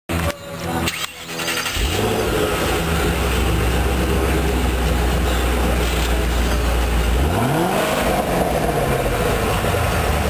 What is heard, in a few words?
mustang